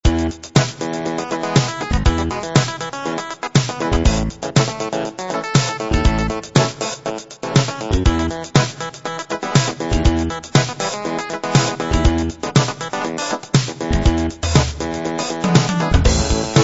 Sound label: clavinet funk